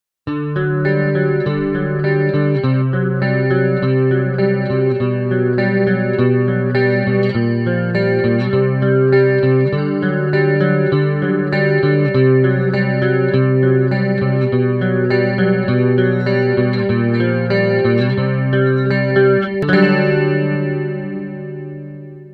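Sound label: Chorus, Transamp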